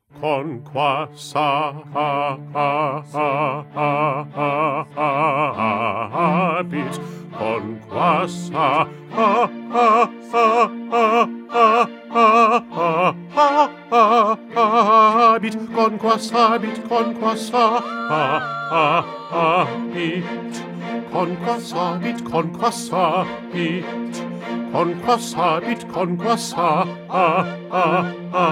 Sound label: Bass; Handel; Dixit Dominus